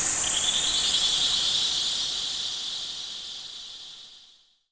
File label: Downsweeps